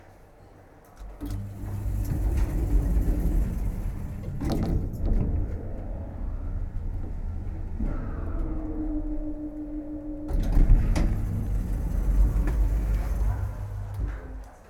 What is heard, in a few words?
Elevator